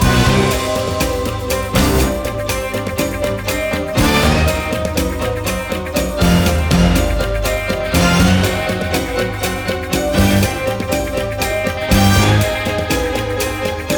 Neon boulevard Hallway Loop; Jitsu Squad; ps4 ps5 switch windows xbox one xbox series xs

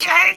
push; Scrat

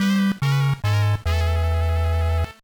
Jingle, gba

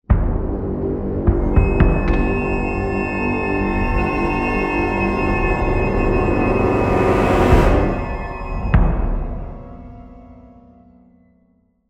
Cage Fall Music; bramble the mountain king; ps4 ps5 switch windows xbox one xbox series xs

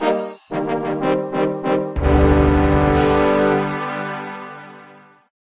reward; Tai Bwo Wannai Combat

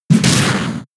Rolling Kick; pokemon sfx gen 7 attack moves sumo usum

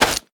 Footsteps Dirt; We Are The Dwarves

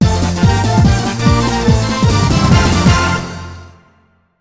Jingle Game Clear; BurgerTime Party

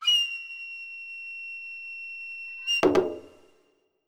Jingle, Stage Begin; Sakura Samurai Art of the Sword; Wii

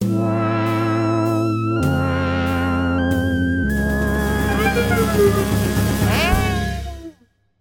Failed; Sprinkle Jr.

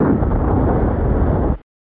Crash Spaceship

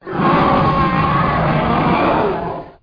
Dragon Roar

